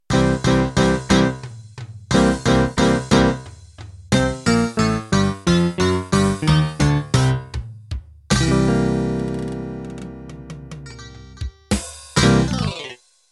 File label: Rockabilly End